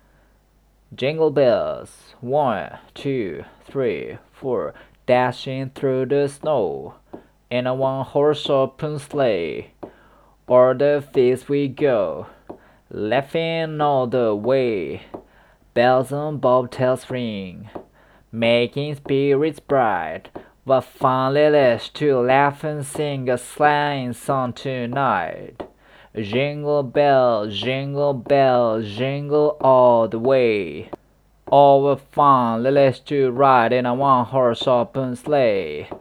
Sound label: jingle bells